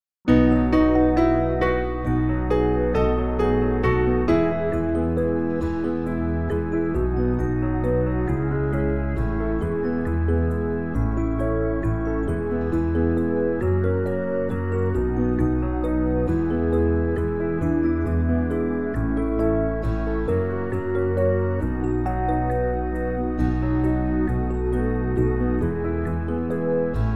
backing; New Hope Music

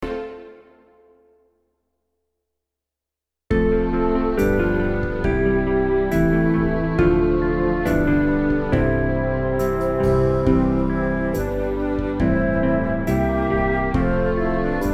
instrumental; New Hope Music

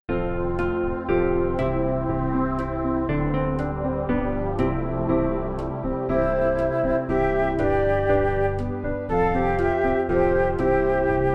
instrumental; New Hope Music

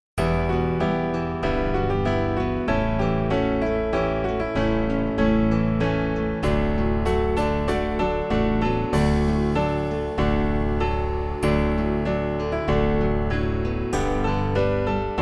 I Am An Axe backing; New Hope Music

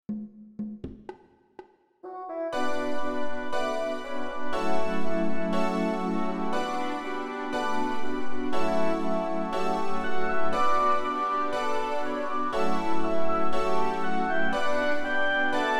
instrumental; New Hope Music

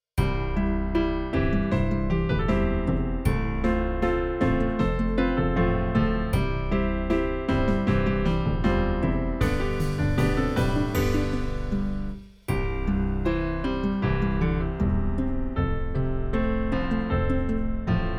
backing; Accompaniment; New Hope Music

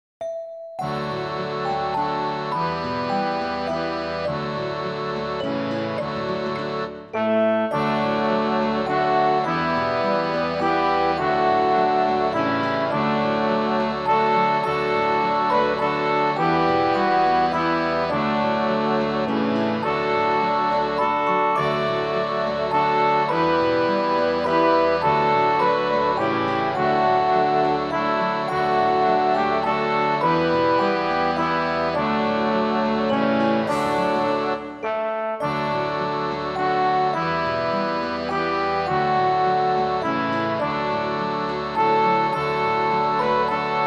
In Bethlehem instrumental; New Hope Music

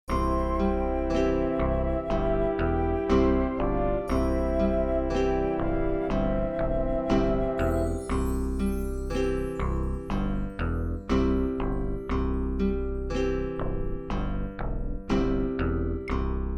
backing; New Hope Music

